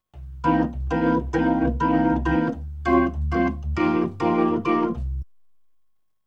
B3 Organ; Masterbits CLIMAX 8

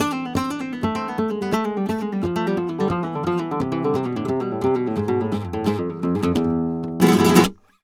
guitar e, Flamenco Remates De Pulgar; ProSamples vol.46 - Flamenco

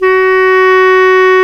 WND CLAR2, Clar Jazz

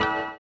orient, bizarre guitar; Spectrasonics

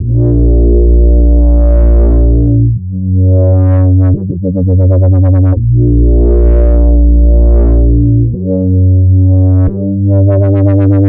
Yhera Bass, Drum n Bass; KJ Sawka; Drum n Bass & Beyond

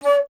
Alto Flute

